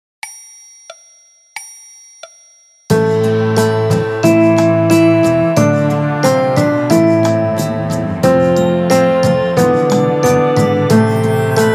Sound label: Piano Orch